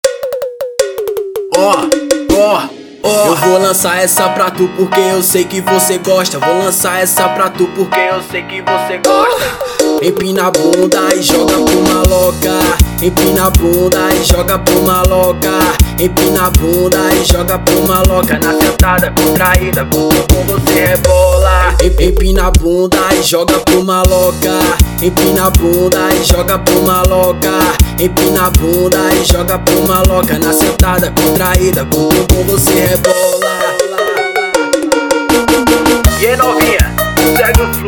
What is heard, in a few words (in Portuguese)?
brega funk